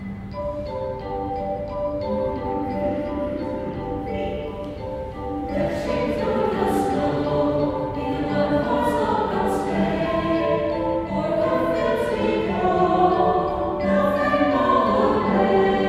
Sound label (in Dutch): Jingle Bells, Koor